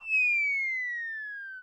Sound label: Cartoon Bomb Fall Whistle; SFX Kit; Foley - Misc